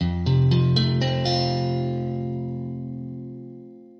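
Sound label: F maj, Guitar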